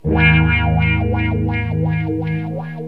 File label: WAH TREM, Distortion Gtr; PlatinumPhattCD2; E-MU Producer Series Vol. 8 – Platinum Phatt